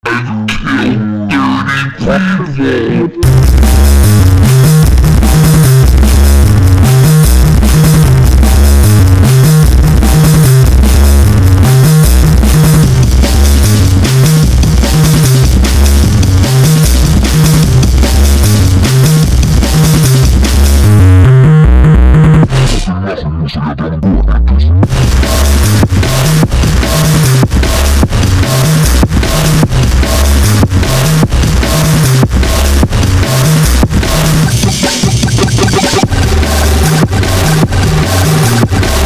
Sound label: drum n gore